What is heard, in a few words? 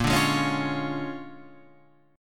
A sharp 7th Sharp 9th A sharp down Guitar Open F; A#7#9 strummed; JGuitar